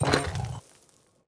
swing strain stone

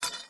ui drop spring; Rust

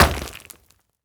concrete; Rust

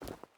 footstep boots forest walk; Rust

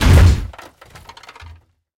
tank impact generic

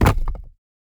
barricade stone deploy; Rust

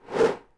Arrow Fly; Civ4 warlords

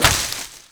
foliage bullet impact; Rust

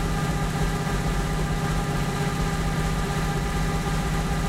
cromwell engine rpm 66; cannons; War Thunder

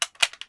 Inf Weapons Foley; Red Orchestra Ostfront 41-45

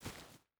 footstep barefoot stones walk; Rust

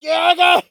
hit; Escape from Tarkov; scav6

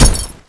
foley impact

